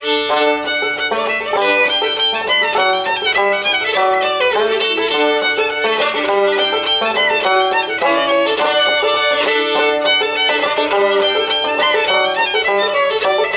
Rocky Mountain Goat aka New Rigged Ship Hornpipe, musicbox